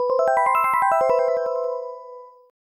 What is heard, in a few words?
Logo (Sega Genesis Version); Konami